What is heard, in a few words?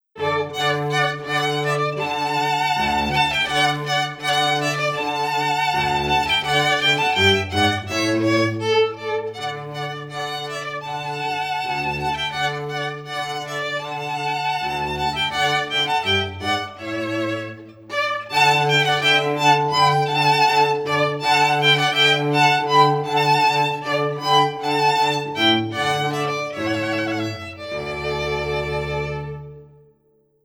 SPRING (TRIO), string quartet